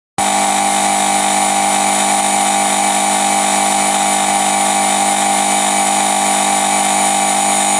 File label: noise-recording